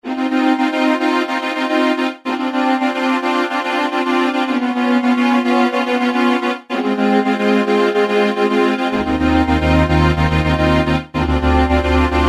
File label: gated synth